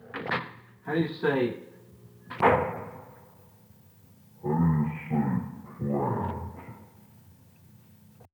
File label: single speed change